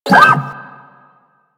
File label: Leg Servo; Warframe; Machines; Jackal